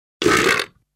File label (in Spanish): erupto, efectos especiales; efectos de sonido